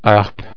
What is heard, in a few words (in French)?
harpe